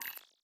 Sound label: ui page exit